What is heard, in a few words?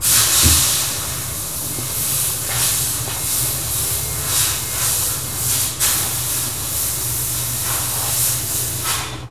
Air blower; INDUSTRIAL